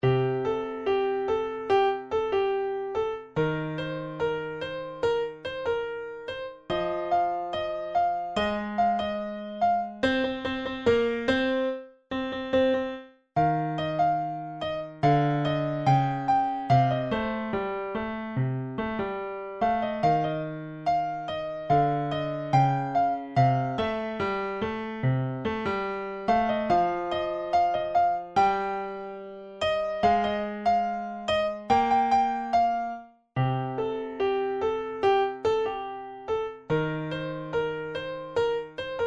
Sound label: Piano Midi